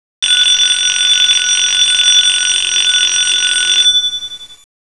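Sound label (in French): reveil, alarm clock; MOBICLIC; langues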